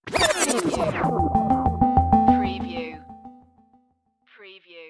Scifi Audio Logo Ident,Tape Reverse,Digital Pulses